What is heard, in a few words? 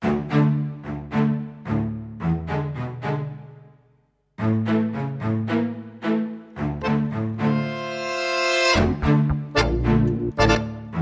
Tango